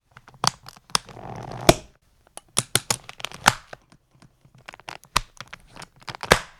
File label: Ski boots put on